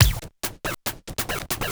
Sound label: DRUM LOOP BIG BT 140; eJay; BigBeat